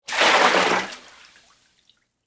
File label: Water Pour Into Tub; Pouring; Sound Effects:Objects; BlastWave